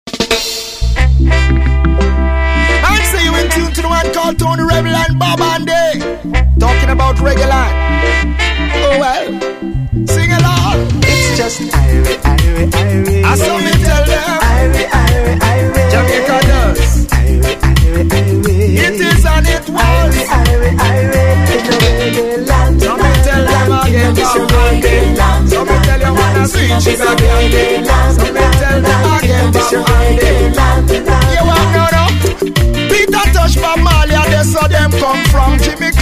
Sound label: Reggae Land Remix